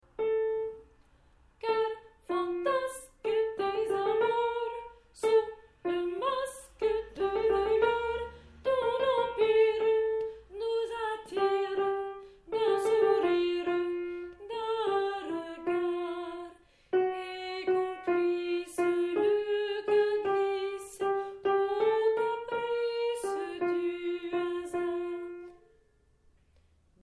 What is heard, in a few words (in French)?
basses; l heure milieu